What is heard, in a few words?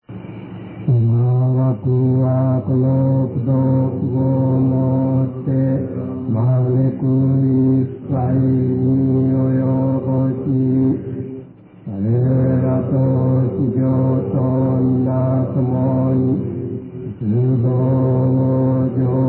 end chant